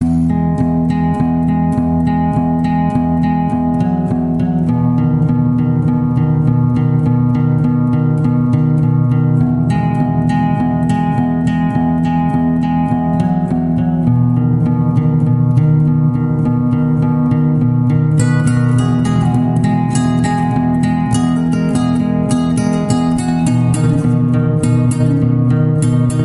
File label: guitar cover BGM ringtone